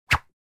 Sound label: Fast swish